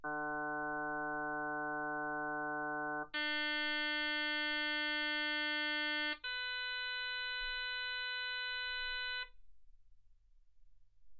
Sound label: harm sinus 48kHz